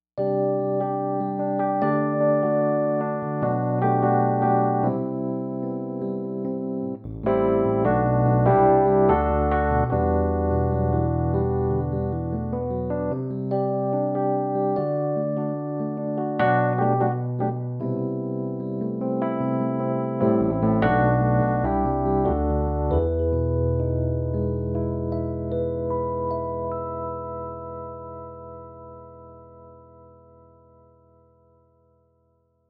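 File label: electric piano; jupiter-80 ep 2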